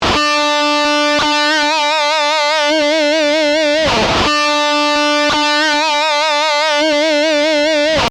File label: Rearticulated Vibrato, guitar solo